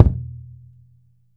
wet bass drum sample e key, Royality free kickdrum sound tuned to the E note; Loudest frequency: 162Hz